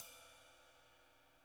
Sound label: ride; Sonic Reality; Interactive Drum Kits; 90s sample cds